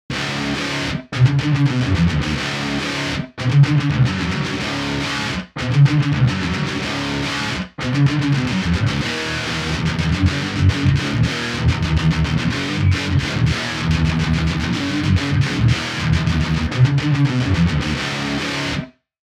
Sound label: Micro Dark CabSim Double